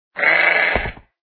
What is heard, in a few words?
sheep attack voice up